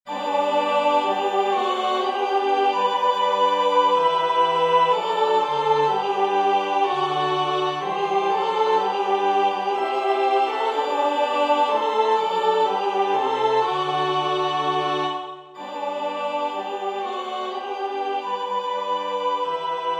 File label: alto; Tollite hostias